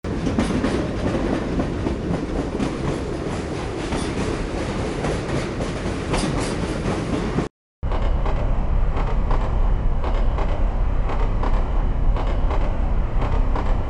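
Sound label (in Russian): поездов самолет вертолет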